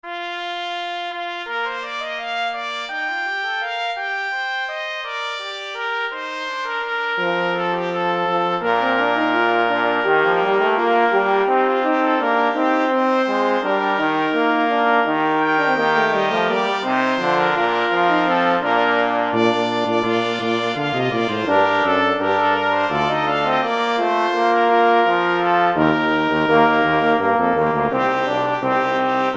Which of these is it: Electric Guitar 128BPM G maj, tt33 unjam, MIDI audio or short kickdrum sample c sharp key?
MIDI audio